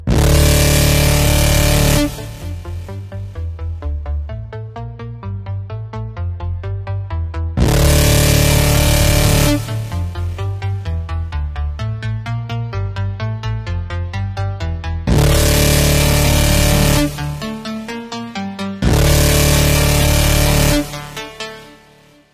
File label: monster tone